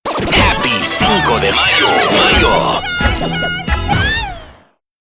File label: grito sweeper; Happy Cinco De Mayo; Grito FX